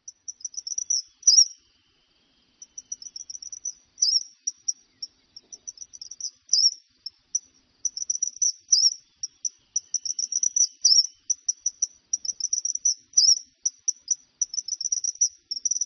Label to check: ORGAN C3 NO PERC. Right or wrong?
Wrong